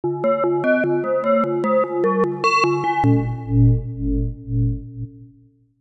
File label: Tube Bell